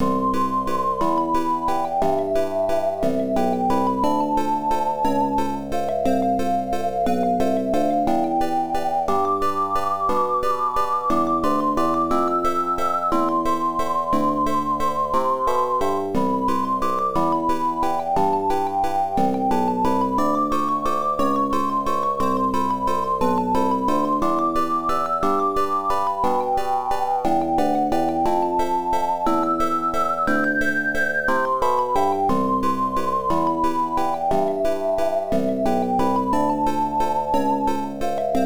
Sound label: waltz; Adventure Tsukuru 98; PC-98